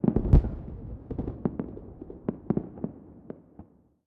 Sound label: ambient port; World Of Warships; port3d